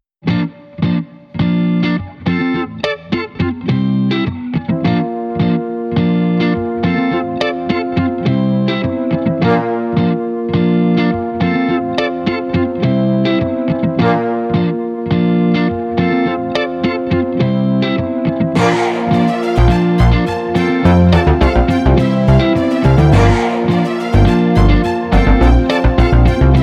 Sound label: Happy Day Guitar Hey and Violins